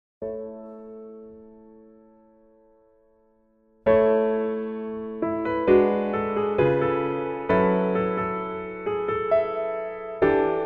Piano MR 멜로디 MR 여자 키

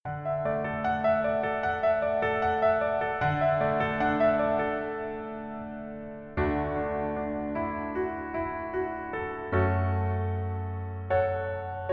Piano ver 멜로디 MR; 꼰대 인턴

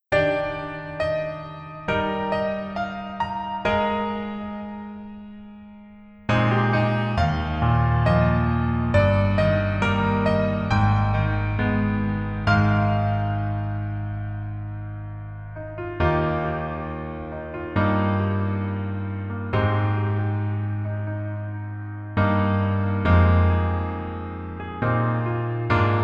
Piano ver, 멜로디MR, 남자키; 하이바이, 마마

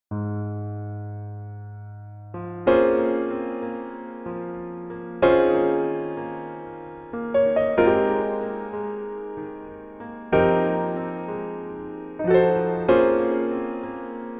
Piano MR, 멜로디MR, 남자키

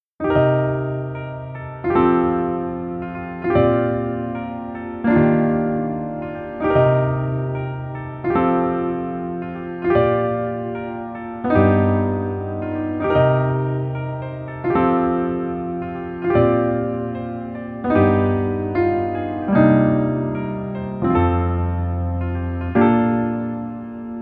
Piano ver, 멜로디MR

